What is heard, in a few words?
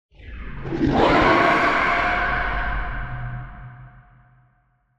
Digital Creature High Pitch Scream; Monster Sounds; TheDeclineOfWarriors; Monster Sounds Volume II